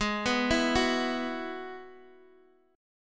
G sharp Minor 7th Sharp 5th G sharp, Guitar Open D; x,x,6,5,7,4